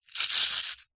hospital syringe suck gel medicine from plastic container